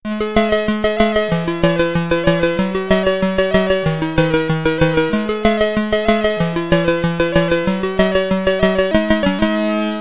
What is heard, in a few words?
Results (SID Stereo); Jet Bike Simulator; Commodore 64